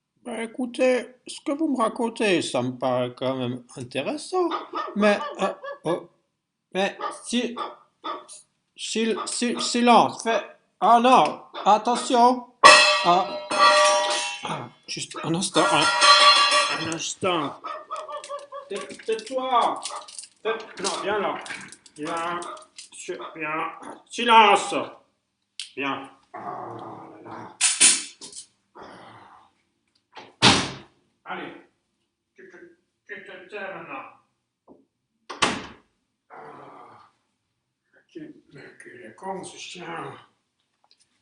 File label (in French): bruit chien; itslenny